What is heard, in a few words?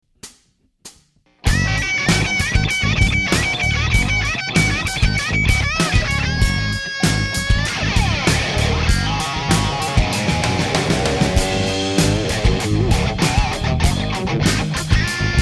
high gain; g-90 oop